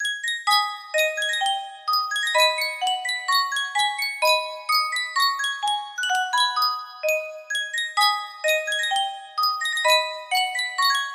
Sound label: sankyo music box, melody